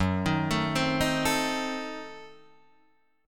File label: F sharp Diminished 7th F sharp Guitar Open D